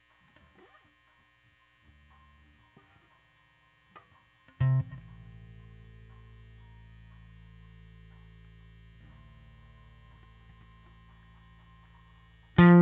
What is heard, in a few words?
Bass OD